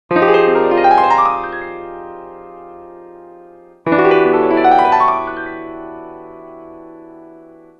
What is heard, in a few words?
Ring Piano